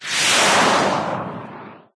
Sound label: tank fire rocket